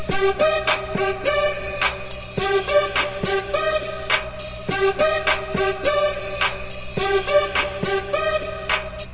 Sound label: loop